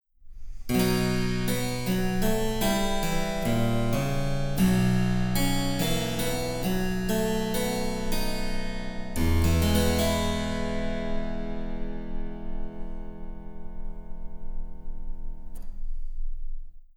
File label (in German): Cembalo; Mark Lindley WTC 1